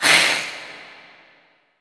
SKULL COLLIDE

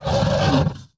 attack

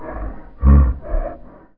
ORC AMBIENT

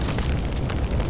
burn

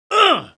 ARGH; mahlendur

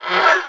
lever

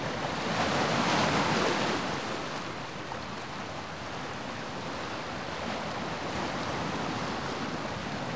WATER WIDE OCEAN

